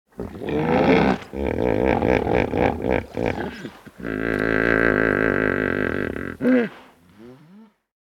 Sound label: Hippo